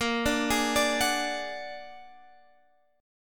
Bb Augmented 7th Bb down, chord; Bb+7; arpeggiated

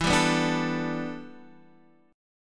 E Minor 11th guitar chord, Open D

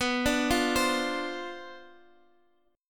C Major 7th Suspended 2nd Suspended 4th B, chord

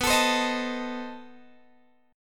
B 7th Flat 9th, down, Guitar, Open G

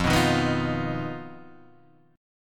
D sharp Suspended 2nd Sharp 5th F

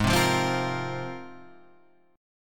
Ab 6th Add 9th Ab, Guitar, Half Step Down

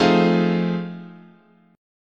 F 7th Sharp 9th, chord

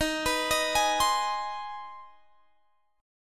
C Minor Major 7th Sharp 5th D sharp

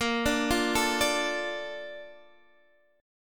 D Minor Bb, guitar; Dm/Bb arpeggiated

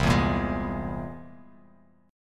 C Augmented 7th, Bass Standard, chord; strummed; 8,7,8,13; JGuitar

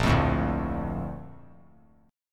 Bb Major 7th, chord; BbM7 strummed; JGuitar

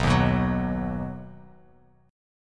B Major, Bass Standard; strummed; 7,6,9,11; JGuitar

